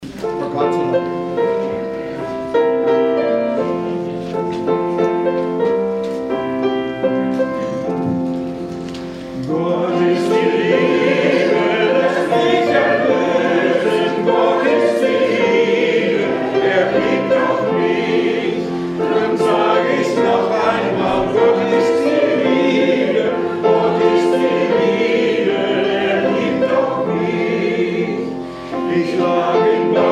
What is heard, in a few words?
hymn in German; For God So Loved Us; Gott Ist die Liebe